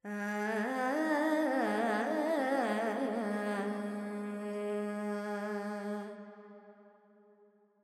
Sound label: Carnatic Female Alaps, Purvi Kalyani, 100 G, Verb; Sample Banks